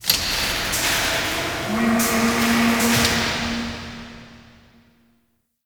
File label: Asteroid Mining Machines; Ambience; Warframe; Grineer